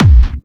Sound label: Clubby Kicks, Bassdrums